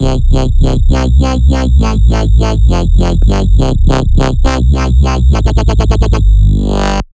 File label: Wob Wob, dubstep bass sample; бас сэмпл